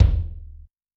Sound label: BD bonzo, Yamaha DTXpress-II Kick